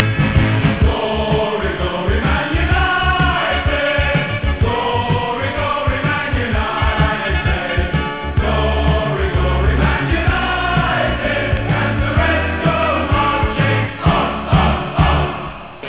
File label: glory song